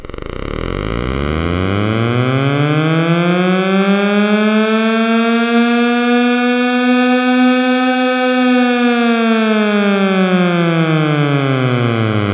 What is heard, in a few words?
FX Alarm; Vengeance Samples